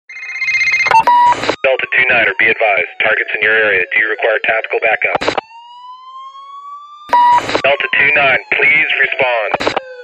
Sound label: police radio alarm